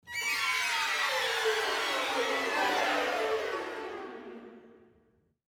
long gliss down

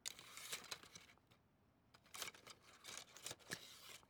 archery foley arrows in quiver

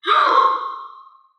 dinosaur grunt; SoundsCrate

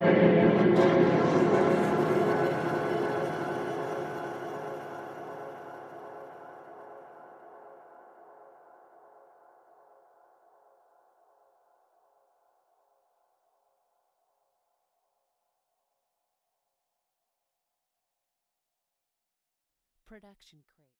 Eerie Metal Hit Echo; SoundsCrate

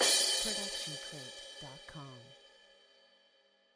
crash cymbal 16 inch; soundscrate

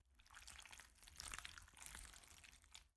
squishy medium; soundscrate

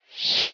Motion Design Woosh; SoundsCrate

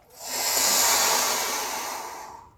balloon inflate

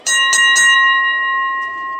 boxing bells

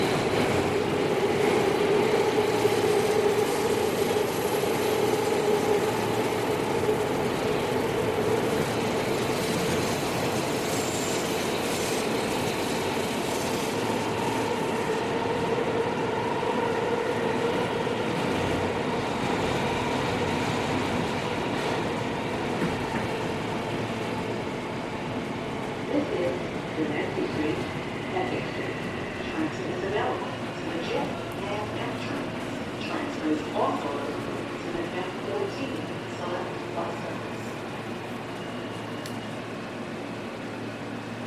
public subway interior; soundscrate

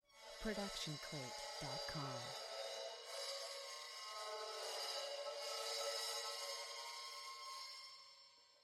hi hat 14 inch scrape; soundscrate

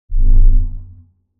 large space ship short rumble; soundscrate

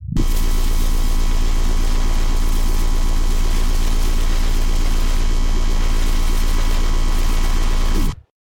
sci fi laser cannon

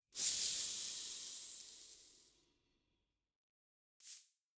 snake hiss

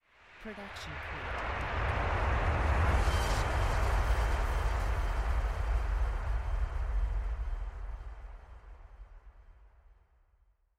Noise Lifter; soundscrate

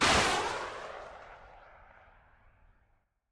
missile shot; soundscrate

